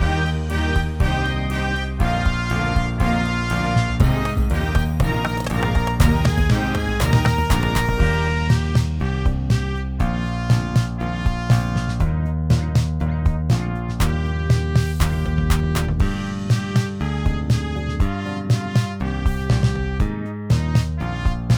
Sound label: Eb Flying High, brass